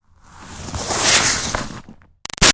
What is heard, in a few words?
Snow Slide By Icy Scrape Smooth; Skiing and Snowboarding; Sports; BigRoomSound